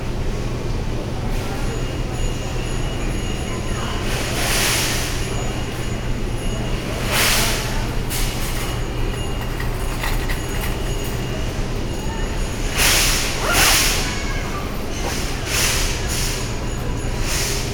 supermarkt, Brasilien; Fieldrecordings

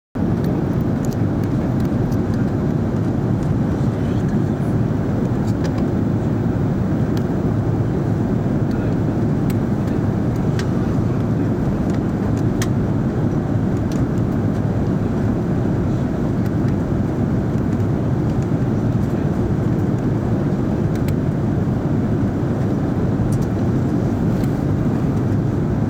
Flug nach Kathmandu, fieldrecording; Original Video Soundtrack; Boudha, Kathmandu, Nepal; Flug TK 0726

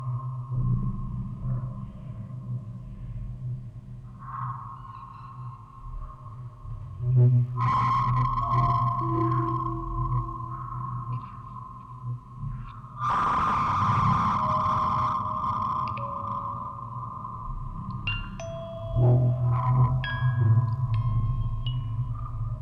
Aufnahme Rode NT4 Tape out Vocoder, Spieluhren-Quartett; Tonband; Fieldrecording

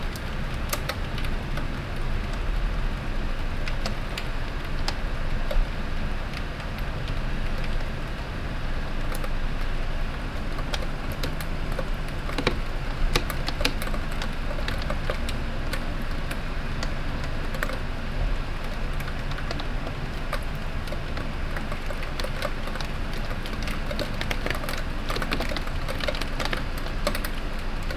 Regen Draussen, Brasilien

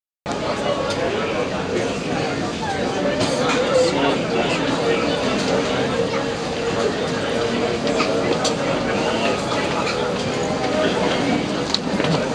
Istanbul Flughafen (In Der Halle); Original Video Soundtrack

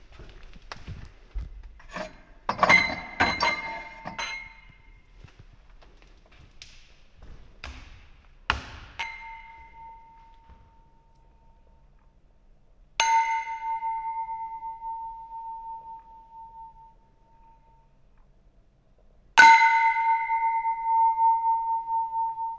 Glaeser; Fieldrecordings; Cafe in Lissabon

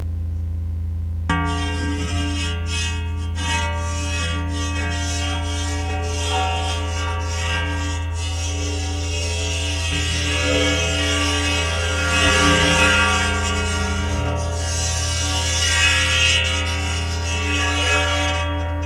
pistazie circle kreide saegeblatt

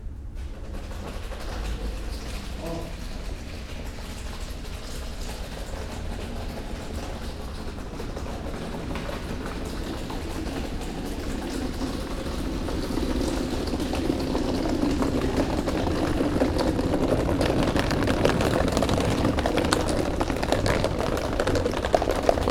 galopp; Fieldrecordings; Brasilien

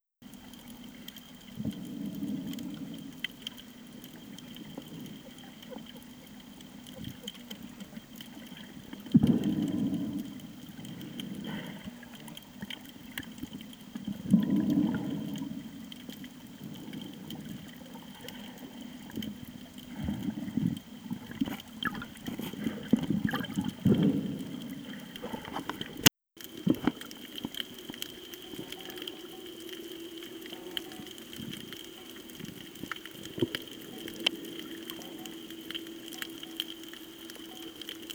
Schnorcheln; Nang Yuan Terrace; Original Video Ton; Koh Tao; 19. Dezember 2019 - 6. Januar 2020 Bangkok - Koh Tao (Fieldrecording Audio, Foto, Video)

